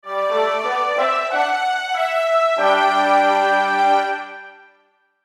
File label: Musical Orchestra Soft Reverb; Orchestral; Blastwave; Sound Effects:Musical